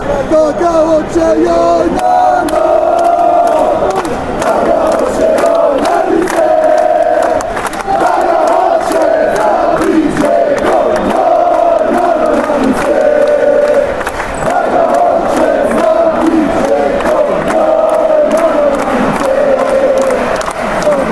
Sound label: bosnia chant